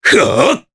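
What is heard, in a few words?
Vox Attack Japanese; Nicx; kings raid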